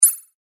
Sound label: Digital Click High Pitched Confirm Scifi; Digital Clicks, Blips & Alerts